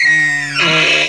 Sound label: pit drone pain; betapitdrone